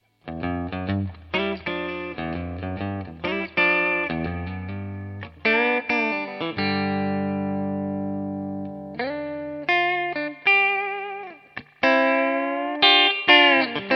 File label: telecaster; Mitte